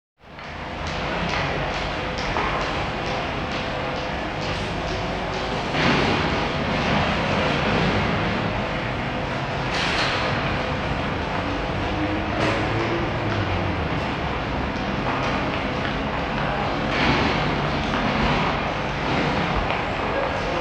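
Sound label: train station ambient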